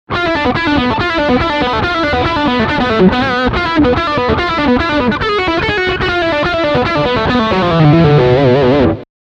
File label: Lead Rear bucker single